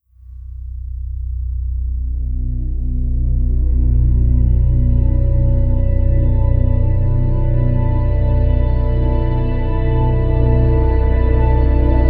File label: pensive drone